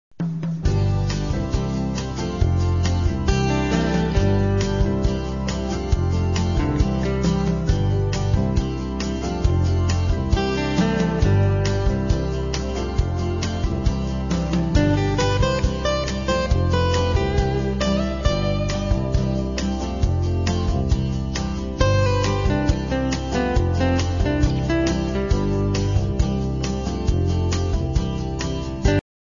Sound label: Rockin USA